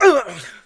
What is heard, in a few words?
Male Hurt; gamerip; Mars Sortie